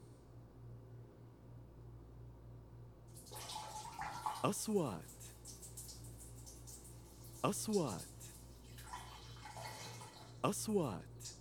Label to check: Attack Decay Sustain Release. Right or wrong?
Wrong